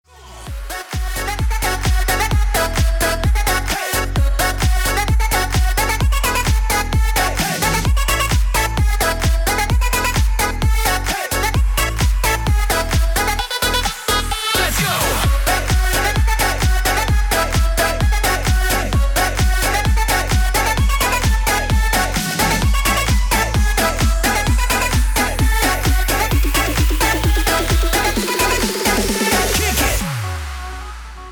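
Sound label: cheerful ringtone for phone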